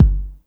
BD, Dance Drums

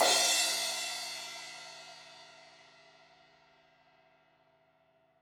Crash 16in edge, Cymbals; Deeply Sampled Drums

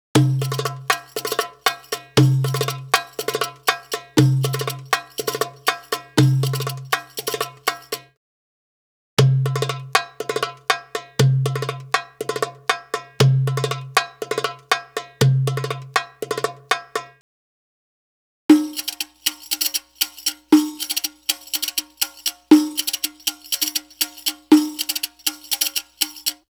Arabic Rhythms

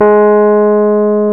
PNO, Rhodes Mk.V; Keyboards of The 60's and 70's

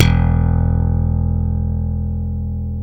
SLAP BASS

